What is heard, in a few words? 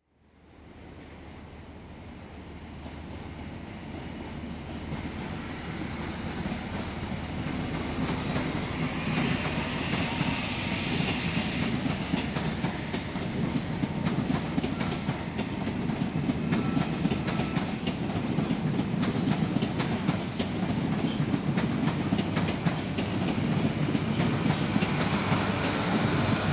Trains; Travel & Transport